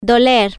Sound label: hurt